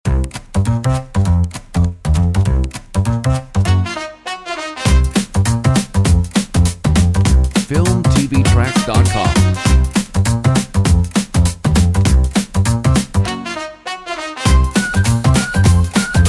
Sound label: BOSSA HIP HOP; comedy funny